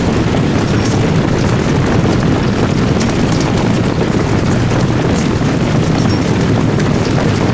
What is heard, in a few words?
infantry